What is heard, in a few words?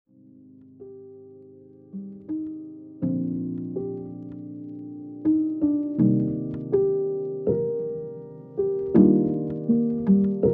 Lofi Hip Hop, Lofi Hip-Hop Beats